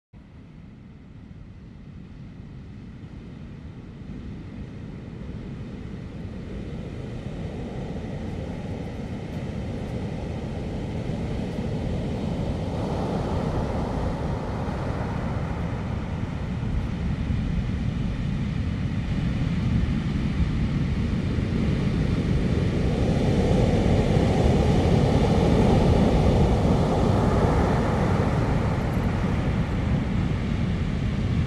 Surf w Lite OM; Surf with OM; Meditation; guided; 20 Minute Meditations

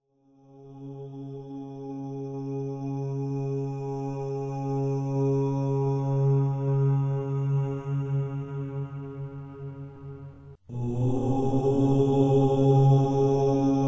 OM Mantra w Silence; Meditation; guided; 20 Minute Meditations

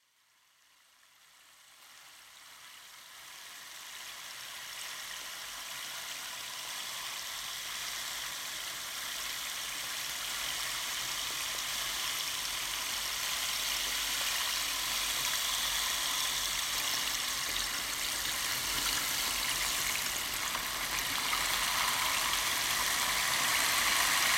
Rainsticks, Meditation guided; 20 Minute Meditations

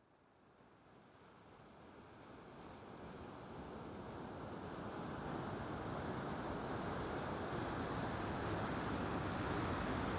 Surf & OM Frequency, Meditation binaural; Linda Mar; Binaural Hybrids

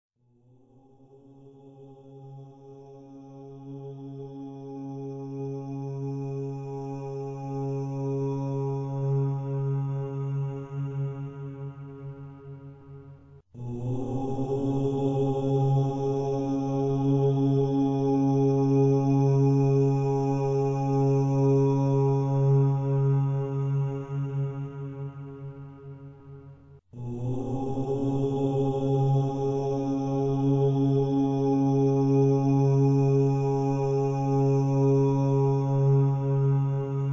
OM; binaural; OM Focus12 (OM lead)(30m); HemiSync Modified

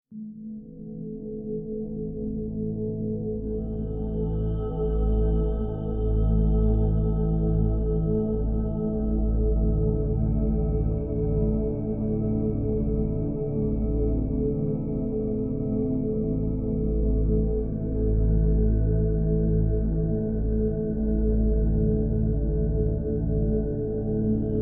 Power Nap, Meditation binaural

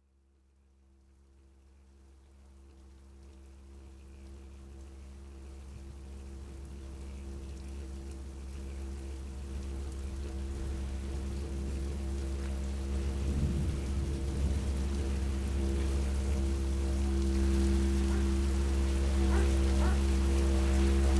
Ambient Didgeridoo; Meditation; guided; 20 Minute Meditations

